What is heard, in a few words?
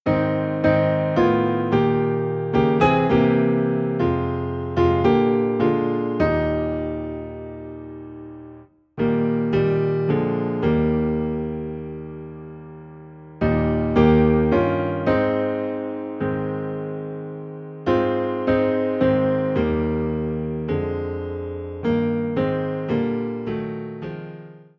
Low in E Flat Piano